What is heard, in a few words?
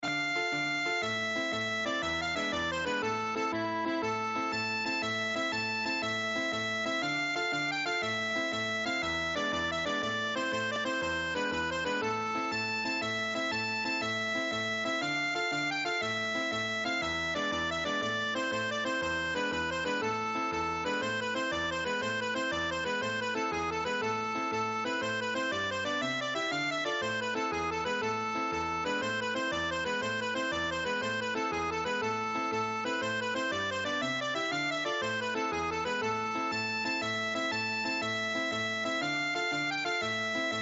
Neapolitan Tarantella